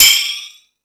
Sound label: Space Drums, Drum Machines